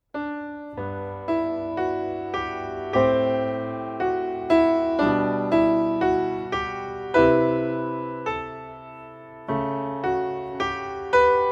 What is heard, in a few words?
in quietude prelude music only